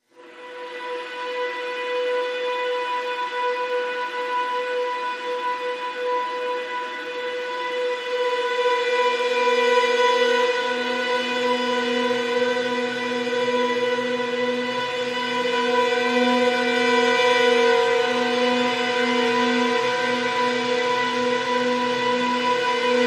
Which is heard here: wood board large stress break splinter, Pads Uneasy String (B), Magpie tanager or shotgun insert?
Pads Uneasy String (B)